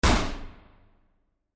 classic gun shot with barrel

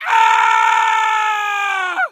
Scream Male; friday the 13th killer puzzle

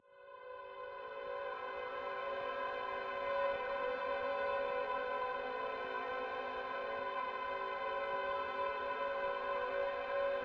Suspence Pad, Low Intensity

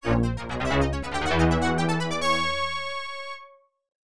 Game Over; paperboy; arcade; Paperboy Quits

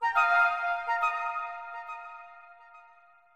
Musician Rebloom Flute

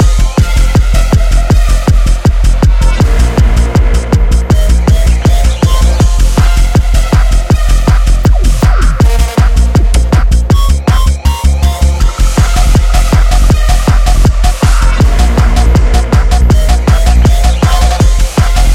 Boss floor loop; Ether Loop

